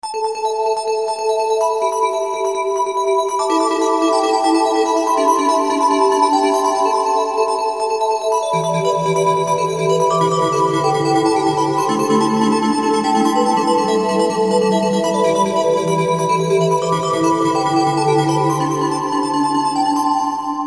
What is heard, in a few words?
korg m1r synth; synth: crystal bell; cpu NEC-V50 (part of 70216 family) manages 16 voices polyphony